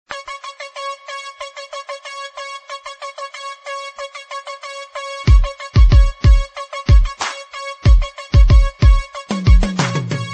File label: Hiphop